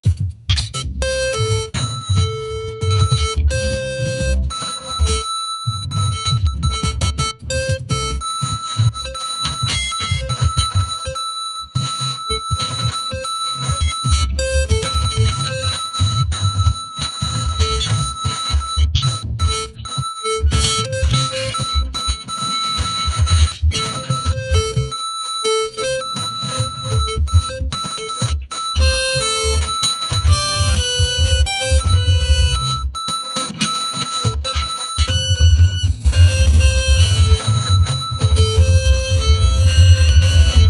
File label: background music for, educational